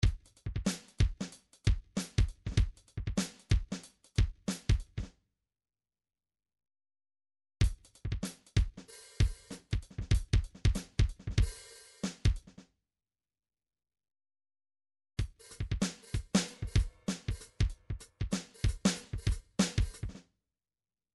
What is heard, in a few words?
drums, midi; Paul Kodish Dangerous Drums